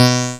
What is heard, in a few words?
shield defend; hyperspace-roll